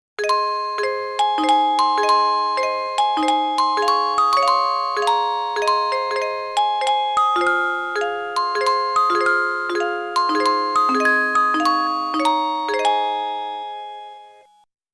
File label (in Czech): melodie